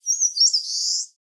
phylloscopus coronatus twitter, animal

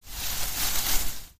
bush

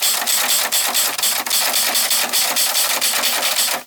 typewriter

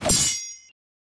sword clash

